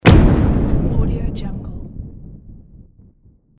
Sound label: explosion; تک انفجار